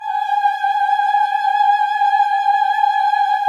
VIB F G#5, Symphony of Voices, VIBRATO; Spectrasonics

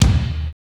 DANCE SET, DRUMS

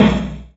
Loop, Chamberlin Rhythmate; 300 Drum Machines; Processed

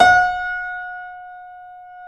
C7 F, The Ultimate Piano Collection; East West

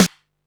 snare, techno 138 BPM

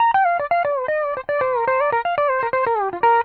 PD LOOPS 120, Whole Lotta Country

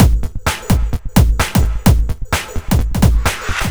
Full, Drum Loops 129bpm; Zero-G; Soundclash Nu Dance Electro; Acidized Wavs

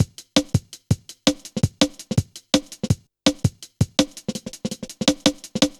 Skippy, Drum Loops, Jungle; Zero-G Jungle Warfare 1, 2 & 3

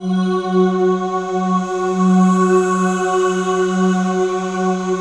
3D ream Voice Pad; E-MU Producer Series Vol. 5 – 3-D Audio Collection; 3DSynths

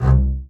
C2 spiccato, arco bass; Northstar - New Gold VOL-2

